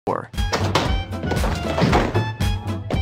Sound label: scrounge fall